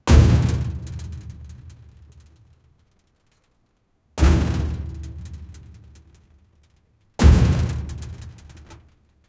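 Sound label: heavy thumping boots stomping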